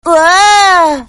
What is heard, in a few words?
Woaahhh Ringtone